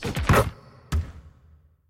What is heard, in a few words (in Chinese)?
投中; 战中; nba